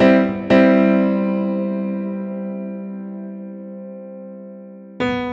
Bluesy Piano

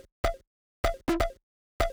Washer P, dance

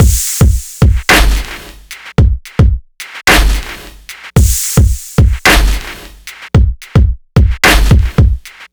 Scroll Kit

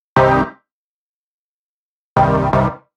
Real Chords; Deep House

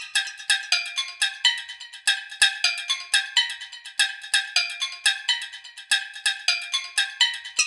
Egg Harp, dance electro

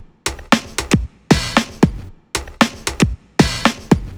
More Pop

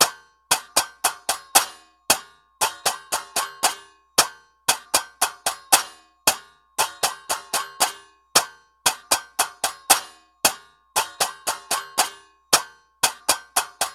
Hand Cymbals Light 115 BPM; перкуссии percussion loops

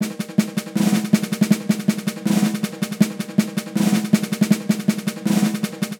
Snare Rolls

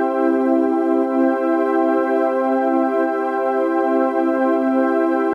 Last Pad

